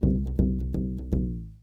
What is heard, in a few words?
Cartoon Balloon Bouncing